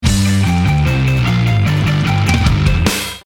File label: start action, music